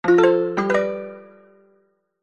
multimedia game sound plucked accoustic and mallet positive tone